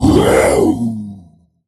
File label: monster growl; soundscrate